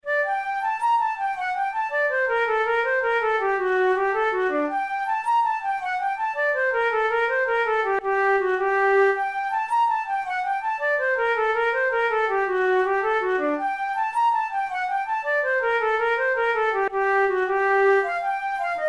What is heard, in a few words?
jigg, flute; wakefield